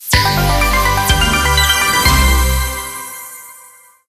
large Win; joker pro

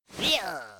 win elbow; green; wildworlds

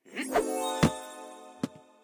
jackpot Throw; irish pot luck

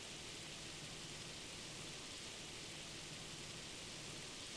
quickspin Reel; colossal pinatas

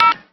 Spin Button; knight rider

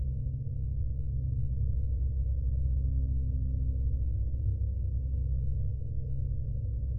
basic Ambience; goldengrimoire

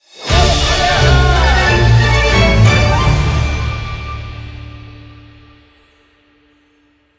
win Large; wild bazaar

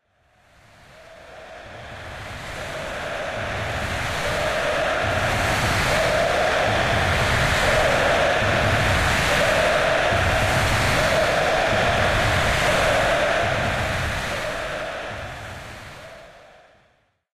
crowd Chant Sweden, football

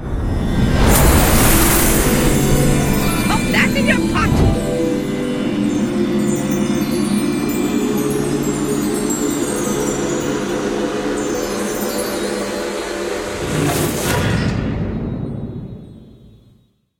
random Feature Cauldron; finn

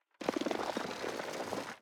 reel spin start; goldentavern

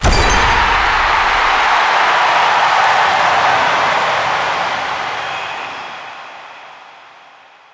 bonus Game Score; football

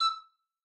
flute; easter egg; golden tavern

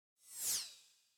firefly; fireflies

